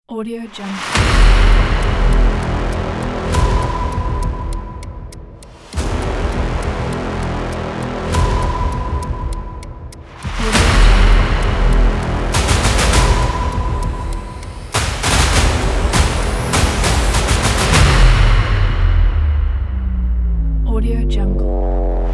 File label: braam countdown trailer